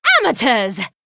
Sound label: Night Elf Emote Attack